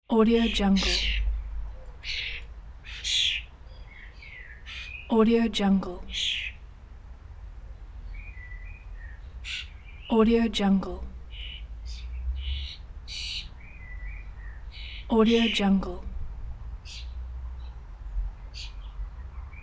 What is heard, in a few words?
birds and ambience from the UK